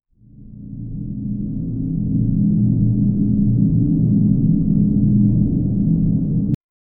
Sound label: dark cinematic atmosphere, underground